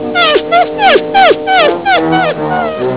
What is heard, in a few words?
whimper